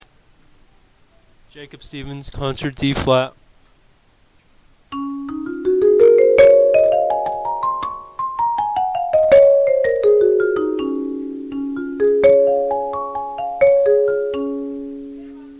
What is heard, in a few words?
concert db